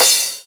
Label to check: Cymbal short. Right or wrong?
Right